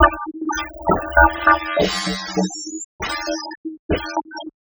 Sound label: synth 100 outthere; Transmission-X